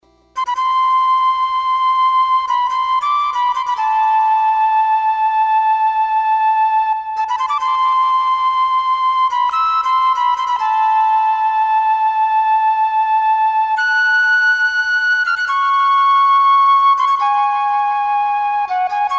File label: flute solo